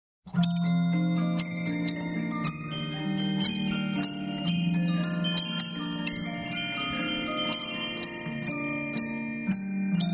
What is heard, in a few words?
musicbox glocken